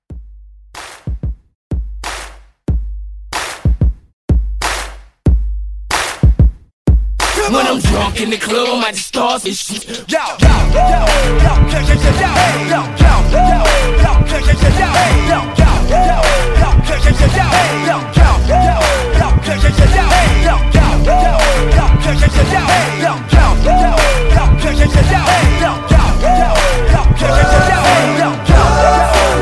Hype Re Drum 93

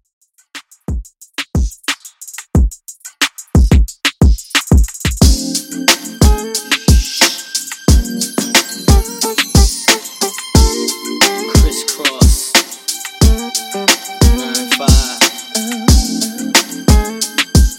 Old School Redrum 90Bpm

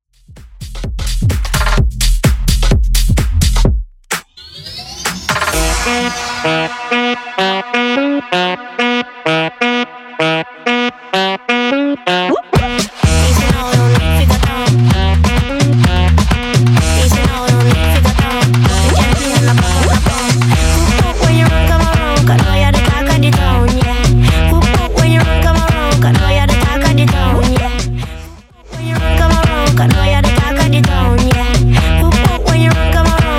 Quick Hitter Smashup 128bpm CLEAN

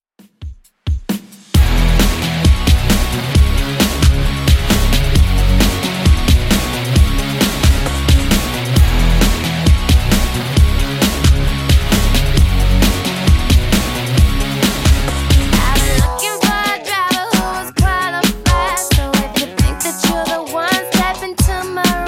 2ks DJ Friendly Redrum 133bpm

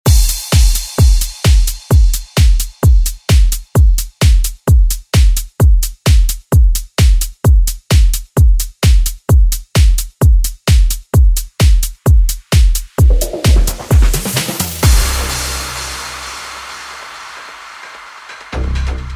ReDrum

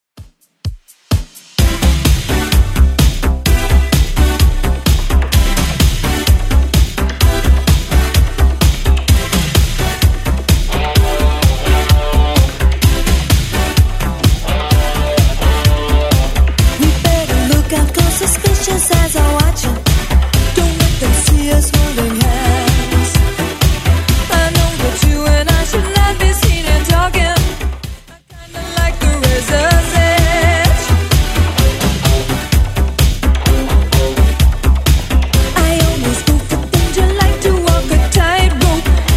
80s Redrum 128bpm

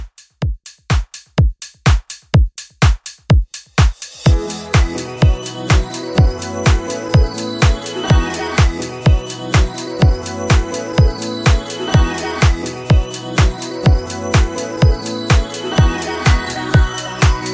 125 Bpm Dirty

